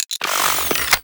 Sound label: Capsule Return; Ambience; Warframe; Clan Dojo